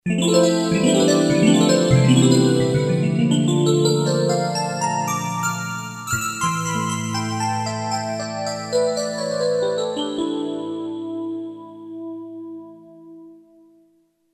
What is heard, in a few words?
resonance; moss